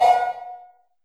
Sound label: LR CB808, TR808